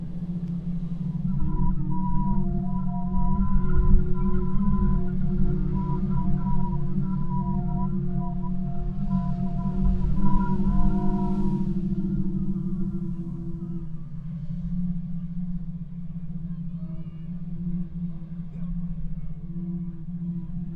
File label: Wind Flutes Laguna Verde